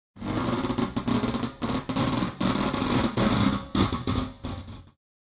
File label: Metallic spring creaking fast